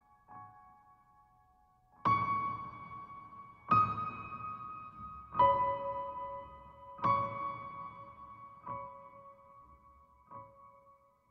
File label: Trailer Piano